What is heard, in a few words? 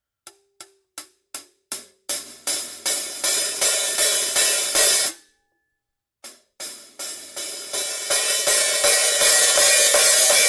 HH close to open, cymbal